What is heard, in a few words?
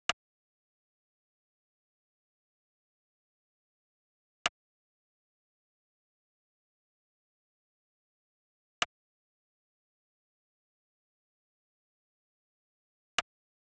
Drum Loop 110 BPM Percussion; Cymatics; Vibes II Drum Loop Collection; Avenue Drum Loop